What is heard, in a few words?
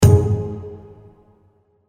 gold Symbol land; Shamans Dream 2